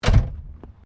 CAR HOLDEN FE INTERIOR DOOR CLOSE, Classic Australian cars; Car Holden Fe 1957; Vehicles